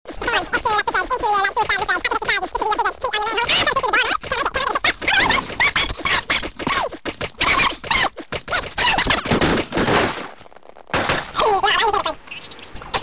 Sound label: door stuck